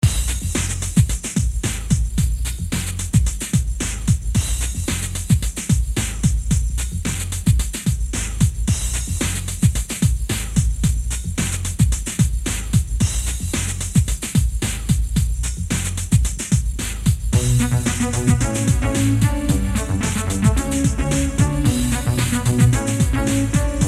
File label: funk